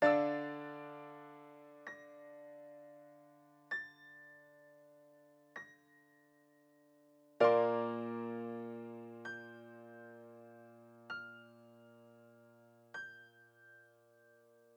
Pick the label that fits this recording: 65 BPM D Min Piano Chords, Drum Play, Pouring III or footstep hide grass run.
65 BPM D Min Piano Chords